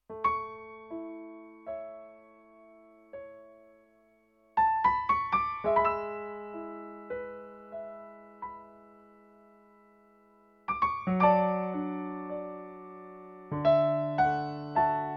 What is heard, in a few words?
Piano